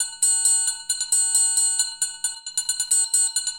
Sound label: Acoustic Percussion